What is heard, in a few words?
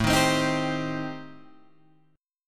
A-Minor 7th Flat 5th, Guitar Open G, chord